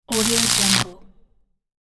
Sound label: electric sparking 60 hz; hollywoodedge